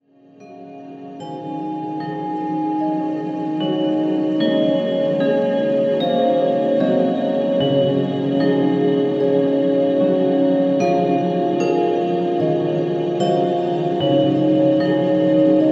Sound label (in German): Instrumentale Schlafmusik